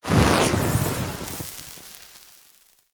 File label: Goblin Blast Mage Death